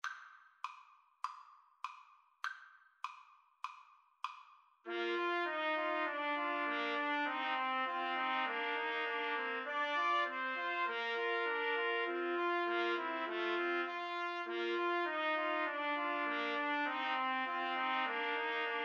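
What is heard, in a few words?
melody trumpet, karaoke; Trumpet Trio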